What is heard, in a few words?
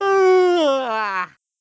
yawning; Luigi; sm64coopdx